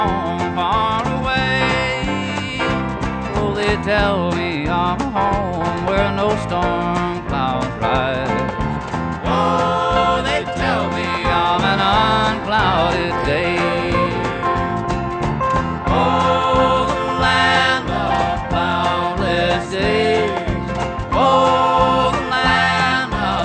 country

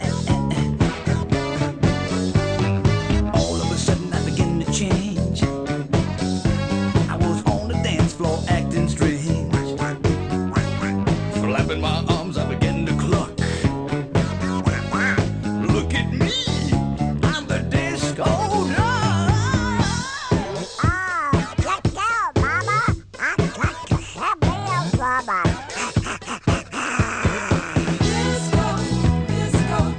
disco

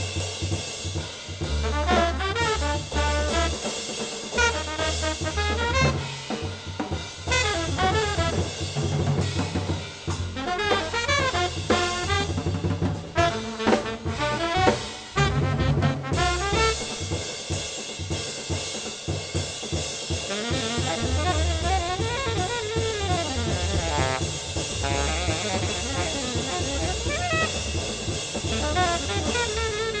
jazz

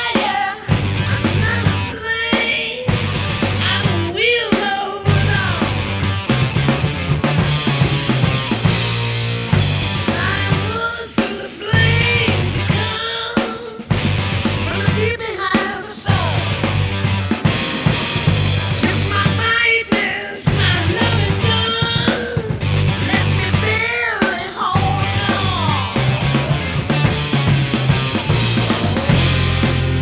rock

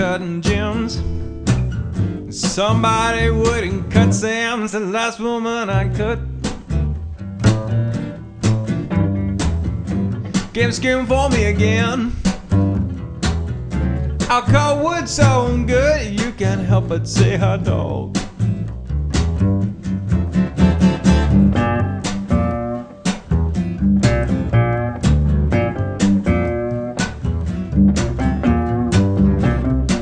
blues

